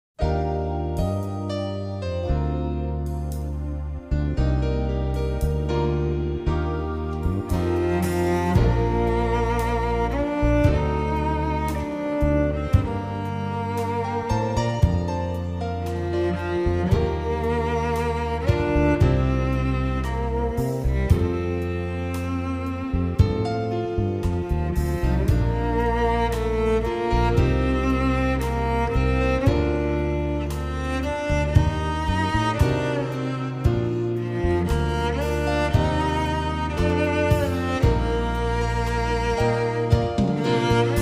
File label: Ambience Cello